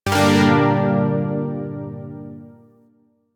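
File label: Shawzin Minor Chord H; Zariman Shawzin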